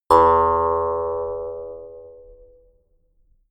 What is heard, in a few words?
mouth harp